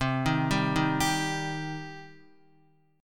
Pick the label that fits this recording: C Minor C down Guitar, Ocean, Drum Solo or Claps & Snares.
C Minor C down Guitar